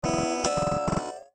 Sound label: alien device